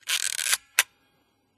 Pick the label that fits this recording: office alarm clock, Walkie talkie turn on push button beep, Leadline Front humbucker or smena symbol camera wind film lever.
smena symbol camera wind film lever